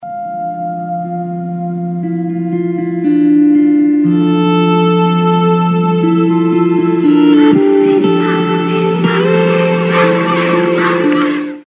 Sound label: Sad flute theme music; Bhaag Milkha bhaag